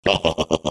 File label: laugh, de; Defective Turret; sp sabotage factory defect